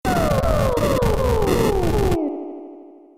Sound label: flare part 1; ff3us